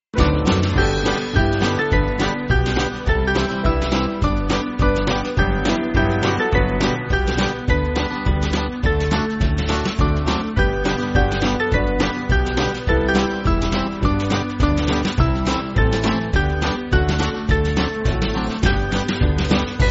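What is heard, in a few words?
Band, Backing Files for Churches; Well Build On The Rock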